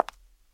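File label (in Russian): одинарный близкий звонкий топот каблука по паркету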